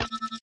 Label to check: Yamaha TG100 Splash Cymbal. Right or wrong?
Wrong